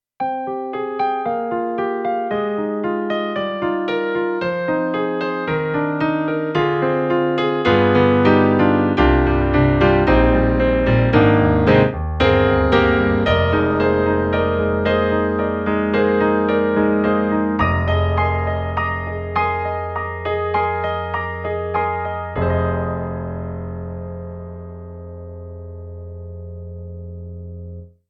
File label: Classic Piano; KORG Triton-RACK